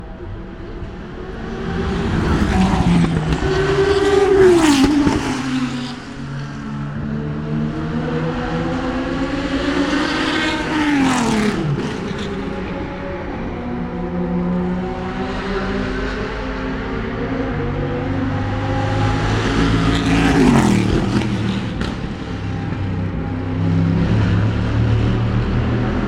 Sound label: various cars before tertre rouge sunday